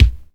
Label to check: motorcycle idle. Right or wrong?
Wrong